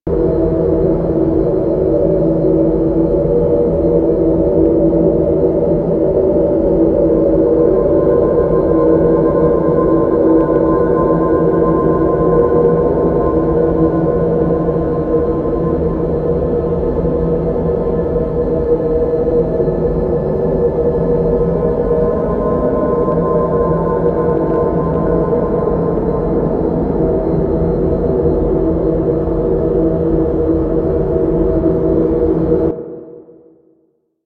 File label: Ice Interior Hallway Amb; Warframe; Corpus; Ice Planet